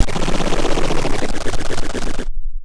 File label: Decelerating Sci-fi Rumble